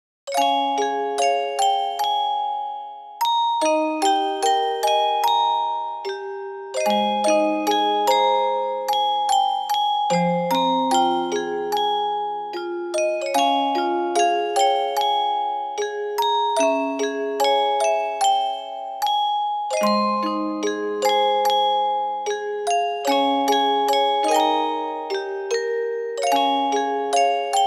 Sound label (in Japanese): オルゴール Version